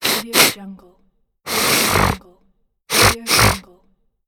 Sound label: sniffing nose